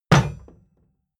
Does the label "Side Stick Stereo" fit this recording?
No